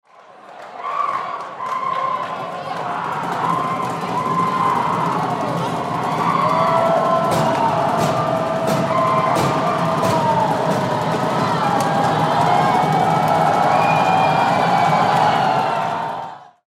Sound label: Crowd Arena Stomp Drumming Swell; Crowds; Backgrounds